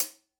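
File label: Closed Hi Hat, Live Trip Hop Drums; Drum Samples; Smoke Signal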